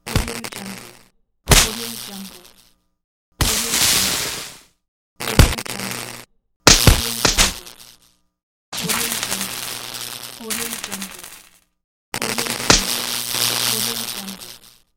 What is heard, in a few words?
electricity